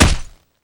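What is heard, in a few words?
crowbar hit body; weapons; tf2; hl1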